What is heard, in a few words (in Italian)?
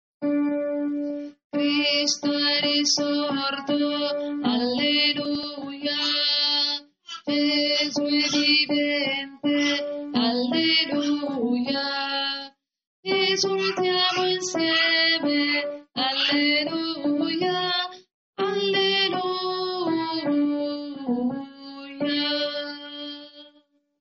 cristo è risorto contralti